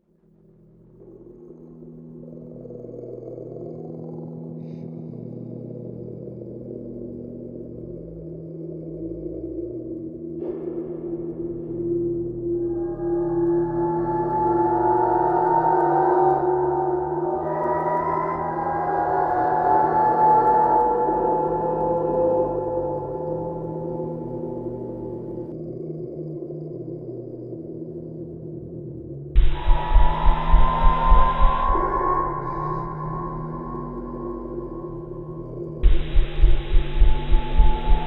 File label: MUSIC SANMEZZ; sh origins